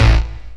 chord A; Techno Trance Essentials; CHORDS

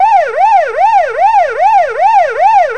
POLICE CAR; FX; Zero-G; Funky Elements

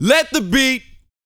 VOX, Vocal, R&B Dance, HIP-HOP

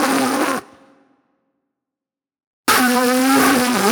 Club Flute

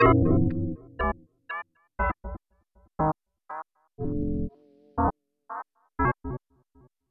Tape Arp

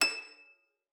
D#7, harp forte; Xsample VOL-8

